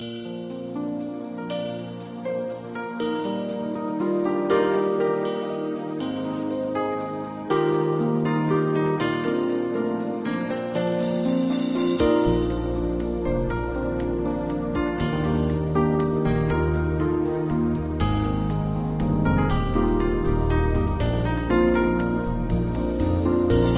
music; Rescue Team 3

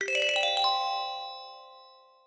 respawn; Rescue Team3

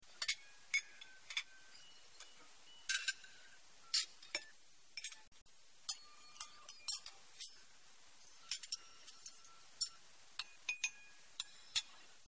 customer eating loop; Delicious Emilys Childhood Memories

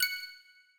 hint; Rescue Team3

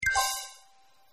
dialog show; Delicious Emilys Childhood Memories

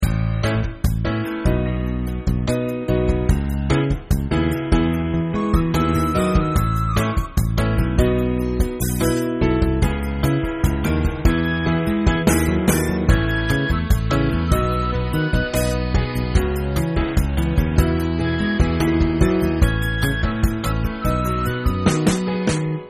Happy; Delicious Emily's Childhood Memories

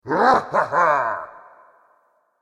bandit scream; New Yankee in King Arthurs Court

